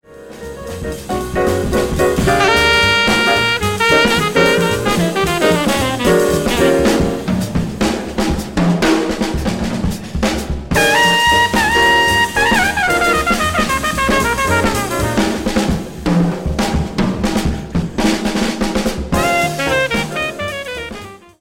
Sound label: drum